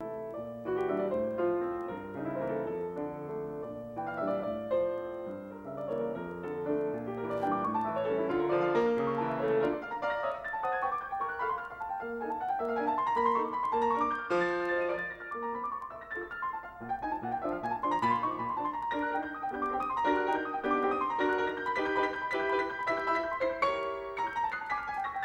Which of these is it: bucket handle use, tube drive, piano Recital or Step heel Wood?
piano Recital